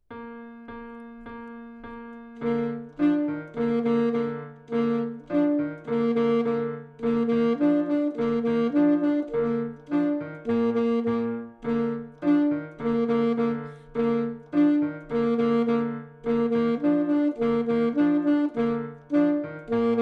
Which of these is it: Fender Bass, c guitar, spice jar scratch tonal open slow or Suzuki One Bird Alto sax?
Suzuki One Bird Alto sax